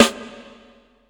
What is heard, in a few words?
bassy snare a sharp key, snare drum tuned to the A# note. Loudest frequency: 1960Hz